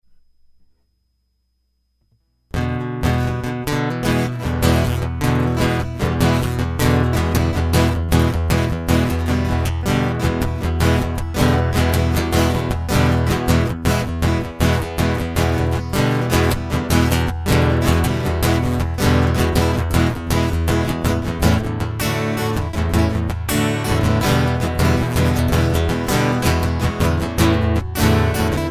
Quite Upbeat Guitar Loop; hyper terrestrial

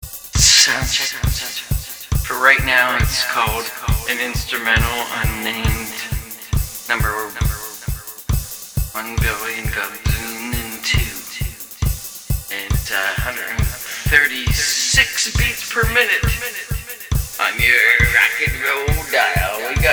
Soundcheck

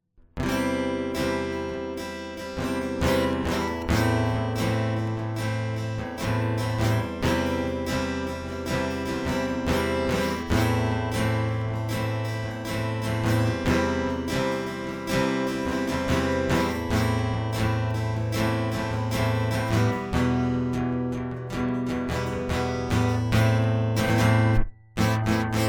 Maria Guitar Solo

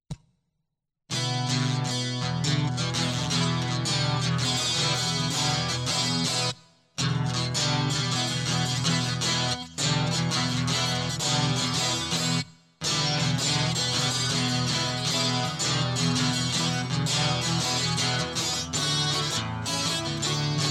Guitar Loop

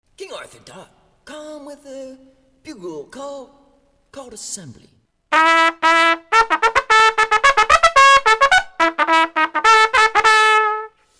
Bugle Call Assembly

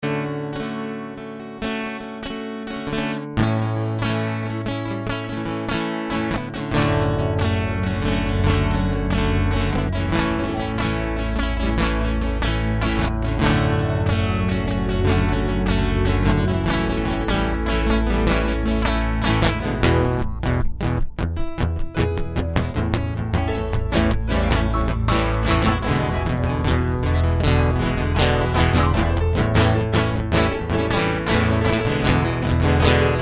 Instrumental

